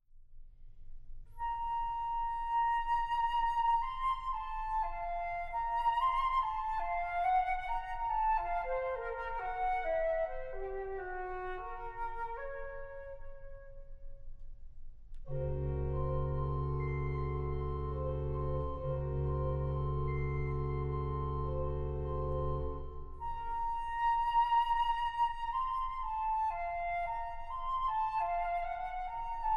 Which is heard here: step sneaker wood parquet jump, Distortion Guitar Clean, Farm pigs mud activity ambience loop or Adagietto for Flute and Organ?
Adagietto for Flute and Organ